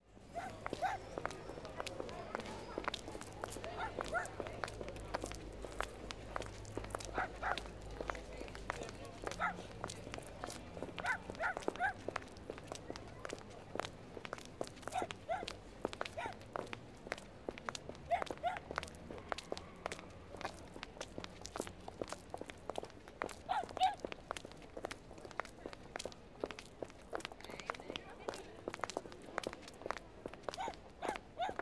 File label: Harbor Village Market